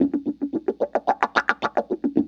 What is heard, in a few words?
WAH PATTE, Funk Gtr & Wah; PlatinumPhattCD2; E-MU Producer Series Vol. 8 – Platinum Phatt